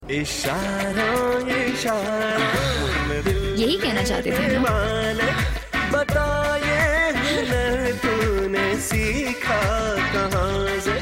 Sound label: Romantic Theme